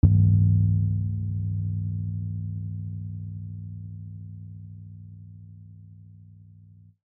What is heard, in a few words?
Distant tolling bell